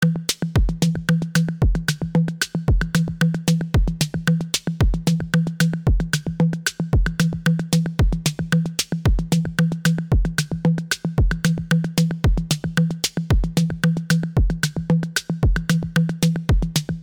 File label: drum